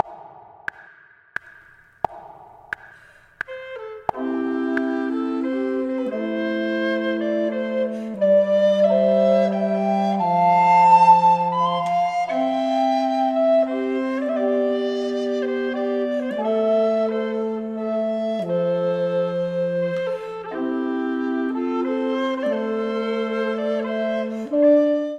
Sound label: Lamentation all voices; Niel Gow's